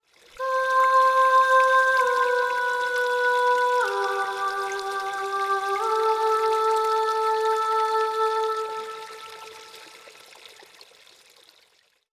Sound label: Voice of Nature